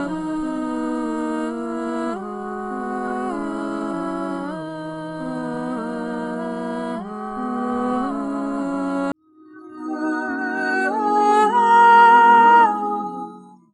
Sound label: voice chorale; Loops; Sad